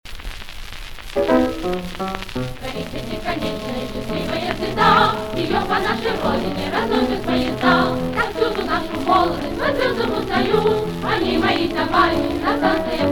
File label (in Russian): Путевая пионерская; Довоенные грампластинки